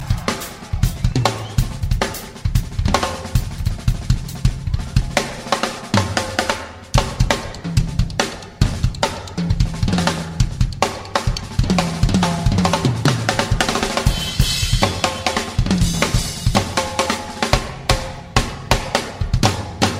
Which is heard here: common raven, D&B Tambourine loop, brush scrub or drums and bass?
drums and bass